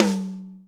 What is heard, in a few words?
HITOMRSHOT, drum kits small